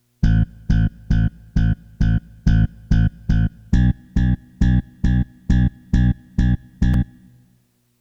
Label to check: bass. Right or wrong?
Right